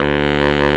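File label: big band fast baritone sax; Roland L-CDC-01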